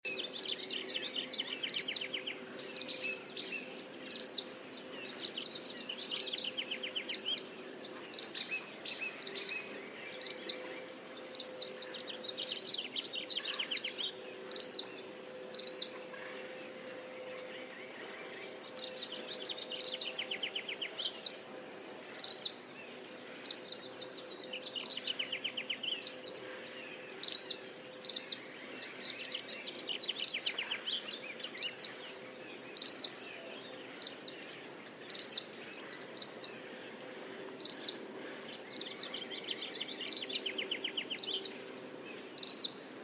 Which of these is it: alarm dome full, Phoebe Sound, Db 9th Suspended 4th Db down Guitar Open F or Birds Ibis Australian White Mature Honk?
Phoebe Sound